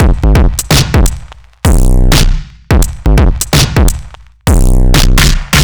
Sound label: Beat Fuzz 85, distorted drum loops 85bpm; musicradar